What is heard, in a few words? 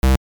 noise